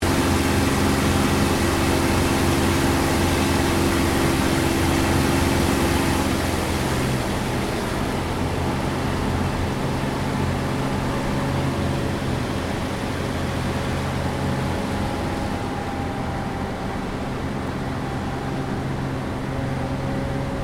AIRCRAFT CAC CA-18 MUSTANG MK23 APPROACH LANDING INTERIOR; CA-18 /P-51 Mustang; Airplanes; Vehicles; Aircraft Collection; Twistedtracks